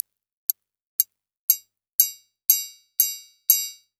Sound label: storm tri loop; maudio; Elektron